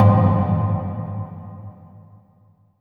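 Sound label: RUBBER WALL, Impacts, Hits & Booms; Distored Reality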